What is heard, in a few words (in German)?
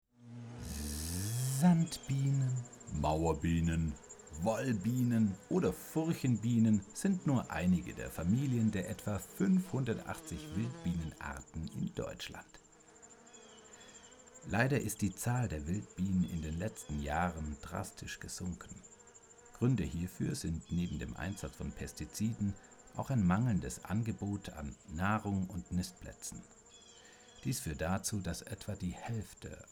BIENEN; Staune Pfad